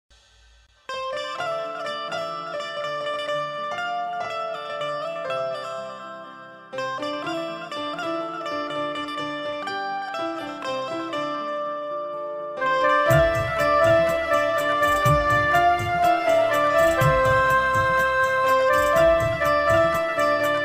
love instrument bgm ringtone flute instrument music